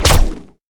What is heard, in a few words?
Weapon Arrow Attack